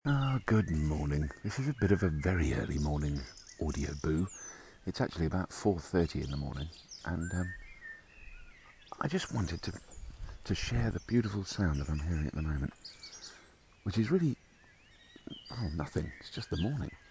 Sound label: dawn chorus